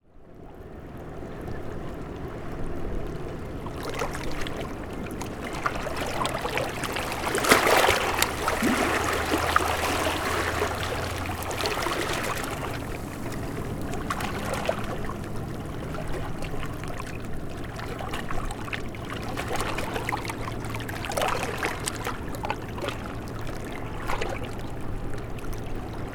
ambience harbor entrance small motor boats pass children in bg small waves rocks; zapsplat